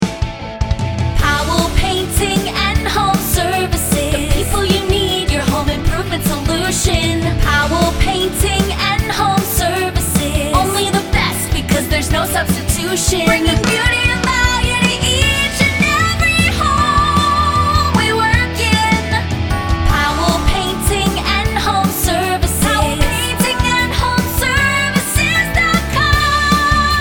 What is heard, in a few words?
Jingle FEMALE VOCAL